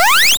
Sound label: jump; realite augmentee unity vuforia